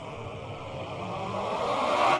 combo; netent

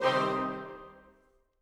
Pick And Click Rose; netent

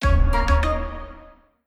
win general; netent

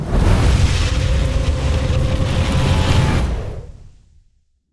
near Win Riser; netent

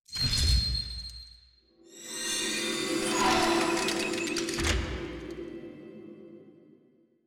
key Inserted FS; netent

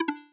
button Stop; netent

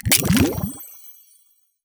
wild From Cluster; netent

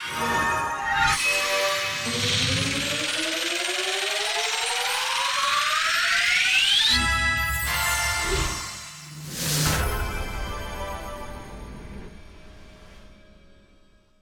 freespin Countup; netent

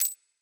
count Up; netent

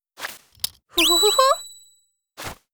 blue Bird Win Arms; netent

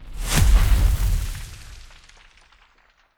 Congrats Flame; fruitblaze; netent

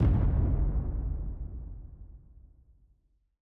Continue Button; netent

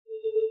gem Pulse; netent

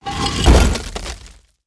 respin hatch close; netent

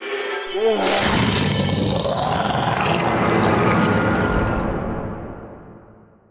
bonus Symbol Transformation; netent

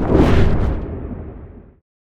transition From Free Spin Outro

